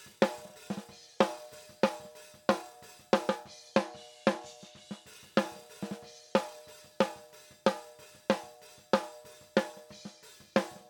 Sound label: Snare High Debleed Long Hold OFF